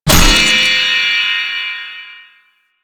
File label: Orb Shot Start; Duviri Medusa Puzzle